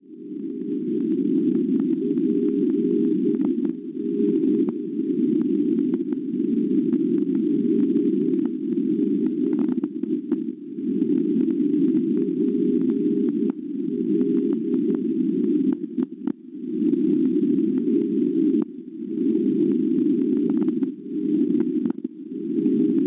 NDB 351 kHz; PL; Perpignan; Francia; Schwarzsee

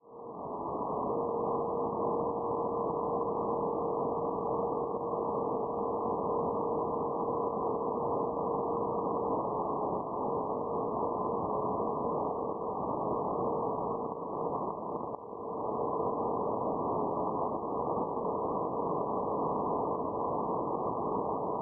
NDB, 407 kHz; LUP; Laupheim; Perseus; Schwarzsee

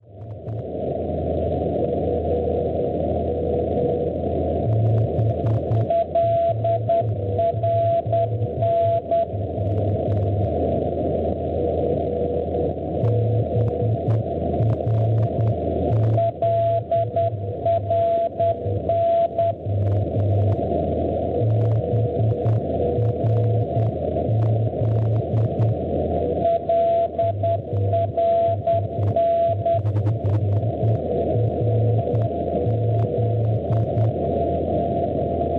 NDB, 398 kHz; LRN; Lorquin; Francia; Schwarzsee

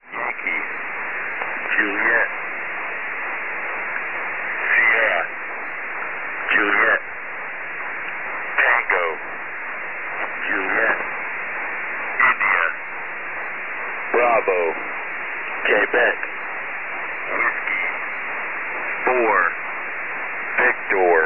Letter msgs 15016 kHz

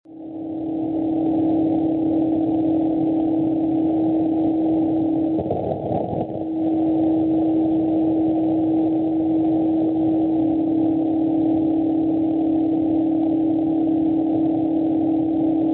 NDB 485 kHz; IA; Indija; Serbia; Schwarzsee

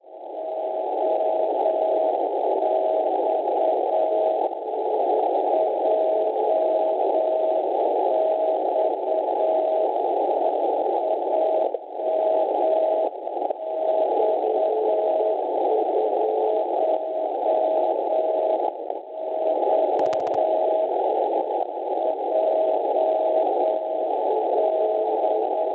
NDB, 349 kHz; JX; Vaxjo; Svezia; Schwarzsee

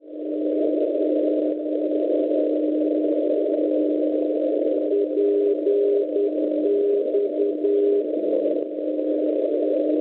NDB 389 kHz; PX; Perigeux; Francia; Schwarzsee

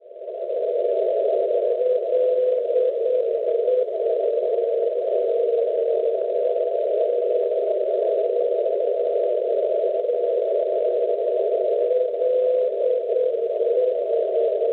NDB Luxembourg Est Lussemburgo 318 kHz; LE; Schwarzsee

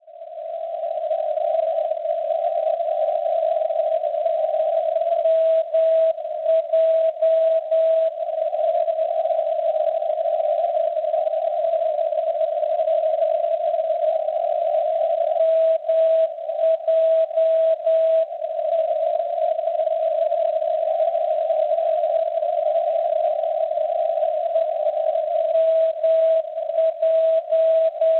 NDB, 406 kHz; MJ; Marsiglia; Francia; Schwarzsee

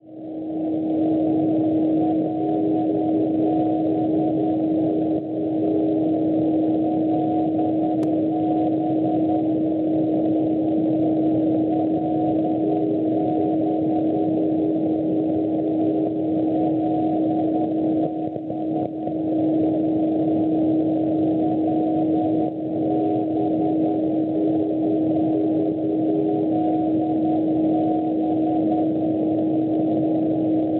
NDB, 370.5 kHz; LB; Angelholm; Svezia; Schwarzsee

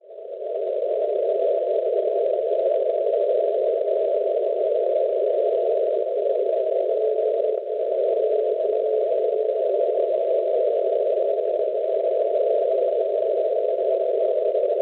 NDB Dole Francia 309 kHz; Schwarzsee

